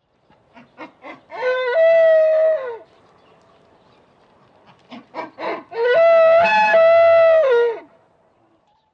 Altaimaral Sprenglaute; Hirsche; les voix des cervides

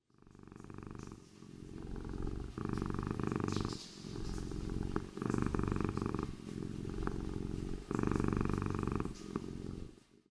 Schnurren, Katzenschnurren

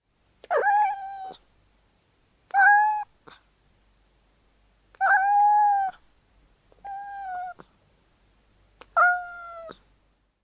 Miauen Junges Mutter; Katzenschnurren

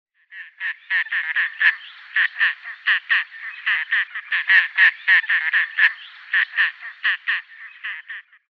Haubentaucher; chants doiseaux les grangettes suisse

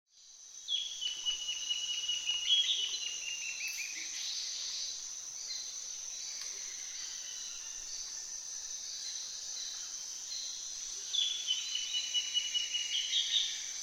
Morgenkonzert, Amazonas Regenwald Terra Firme; Rio Bariuau; Amazonas 3

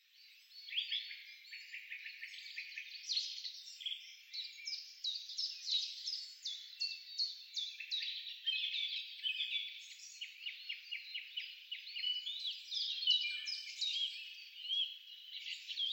Vogelstimmen im Wald

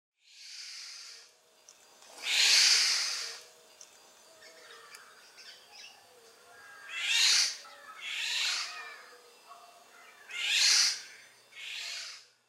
PIROL Warnrufe; vogelportraet; oriolus oriolus

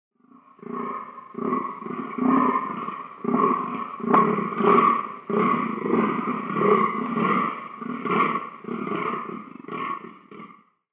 Damhirsch Brunftrufe; Hirsche; les voix des cervides

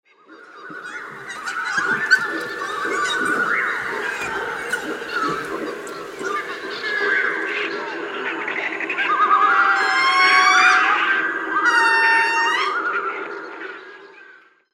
Im Affenhaus; ZOO; erlebnis zoo

